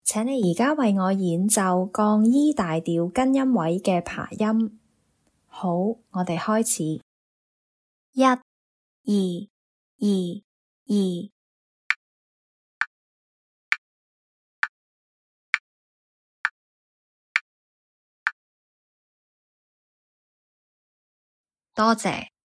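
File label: E flat major arpeggio root position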